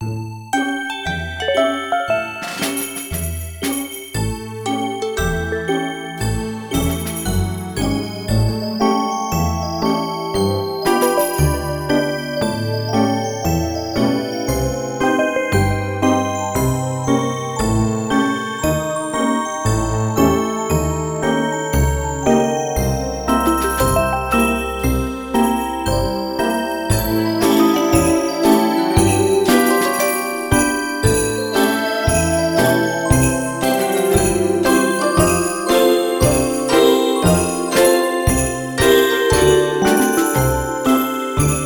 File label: Base Idle Plant Snow; Rush2